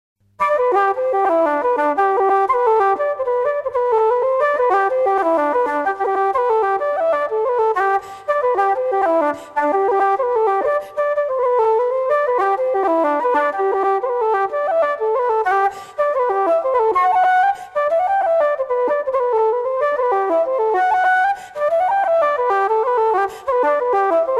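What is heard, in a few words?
Flute, Traditional Irish Music; Wood Cock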